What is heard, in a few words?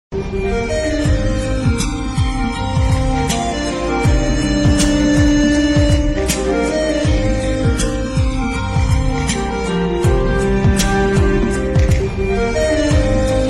ringtone 8D version instrumental ringtone